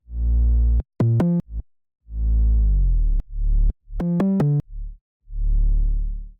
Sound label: Bass